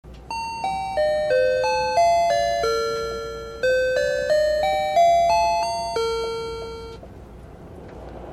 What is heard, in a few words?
kintetsu chime